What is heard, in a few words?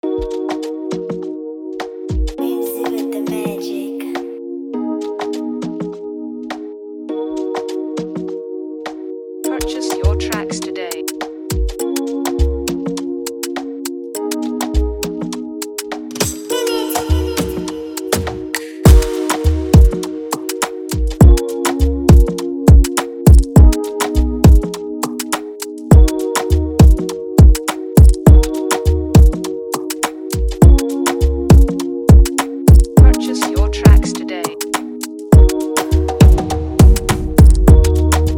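Afrobeat Instrumental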